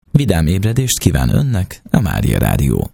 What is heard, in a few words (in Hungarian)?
REGGELI JINGLE; szignalok; SZEKELYUDVARHELY